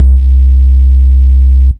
synth resonant, Hard Resonant Synth Bass, C2; multisampled

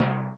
TIMPANI, Kawai R50; DRUM MACHINES

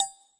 Plink, Roland TD 7; DRUM MACHINES

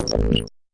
fx drum

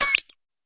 tight hit, Tight n Hard Kit; STYLE KITS (Hip Hop, Etc)

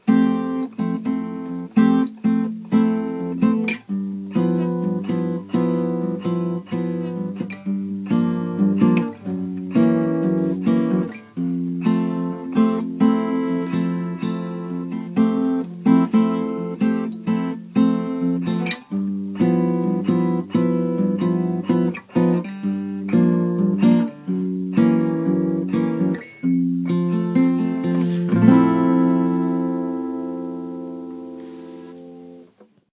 nylon guitar; GUITARS

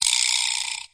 vibraslap; Western and Latin Percussion; single hits

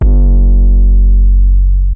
Super Charged 808; drums single hits; Khezie 808s

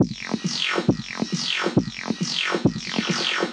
136 bpm, dying robot beat, drum loops and breaks

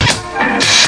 weird break, DRUM LOOPS and BREAKS; FILLS and ROLLS

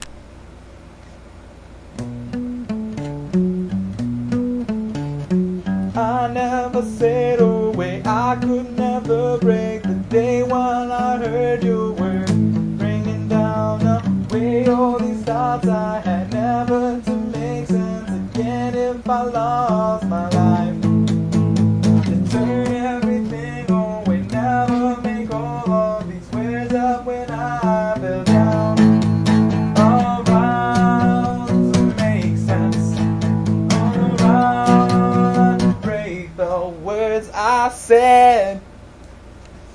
guitar and vox

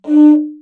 didgeridoo high toot; world

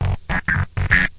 80s bad, 101 bpm, drum loop; 101 to 110 bpm

